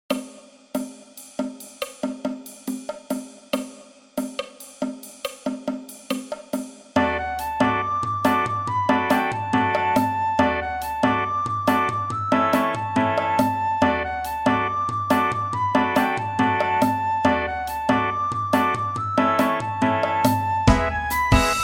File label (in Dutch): sopraan rustig